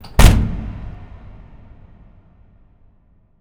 impact hatch; Hits

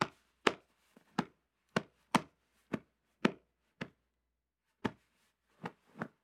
Gloved Hands Hitting; Fairlight Sound Lib

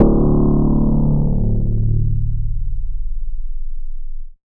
Dark, Deep, Dark

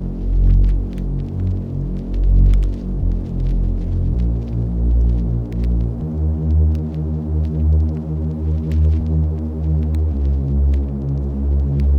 Lofi Melody Loop - 80 BPM F# Maj Bass; Melodics; Loop Stems; Cymatics; Eternity

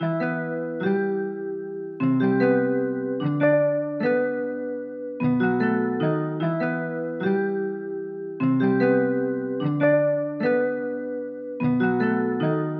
Nostalgic Melody Loop - 150 BPM E Min Bell; Loop Stems; Cymatics; 2020 Melody Collection

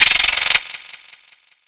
misc oneshot, FX; GhostHack

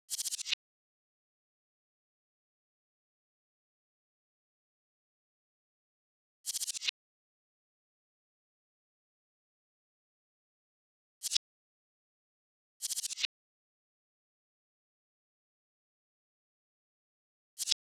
Jungle Drum Loop - 151 BPM Hihat; Loop Stems; Cymatics

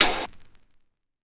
Hihat; Ghosthack Advent Calendar Day 4

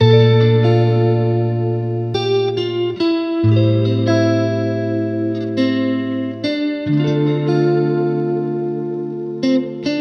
Empty, 140 BPM D Min, Guitar Loops; Cymatics; Journey Guitar Loops

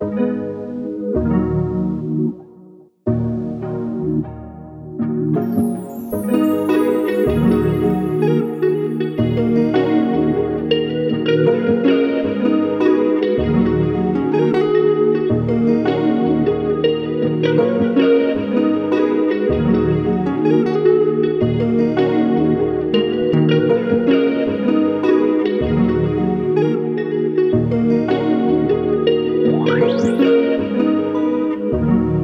157 BPM D# Min, Melody; Cymatics; 2022 Melody Collection

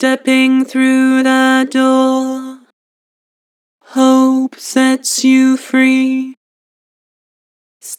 Vocals Flat Stepping hope; Harmor

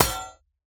UI Metal Armory Tab Dry; User Interface

